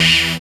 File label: DR. LOOP, BUZZ; X-Static Goldmine 3